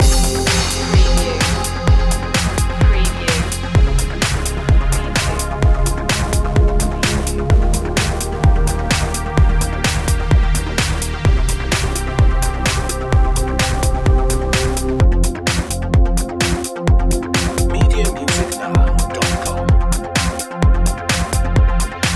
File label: friday night loop